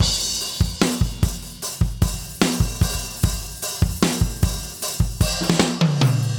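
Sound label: 75 bpm, beat loops; Zero-G; Monster Beats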